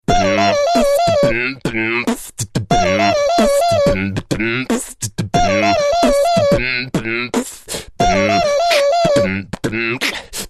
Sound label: beatbox ringtone